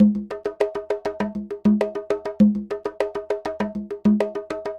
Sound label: Conga Groove, Latin Percussion; Sonic Foundry (Sony Creative Software); Joe Vitale Latin Percussion; Grooves